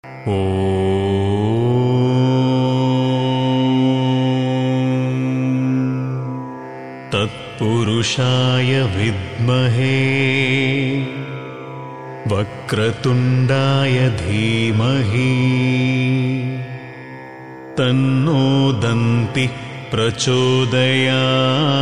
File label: ganesha (tatpurushaya) gayatri male